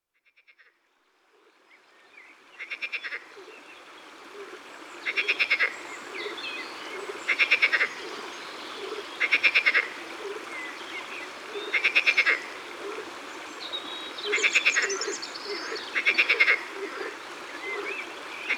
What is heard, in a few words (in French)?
Grenouille Verte Rieuse; Ardeche; Concerts Naturels De l'Ardeche